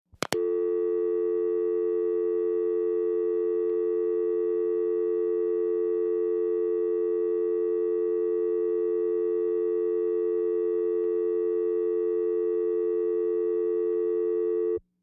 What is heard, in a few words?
Tel Dial Tone Click, futz